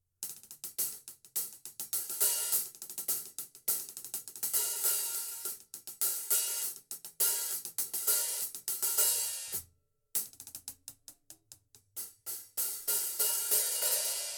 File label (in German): Becken einzeln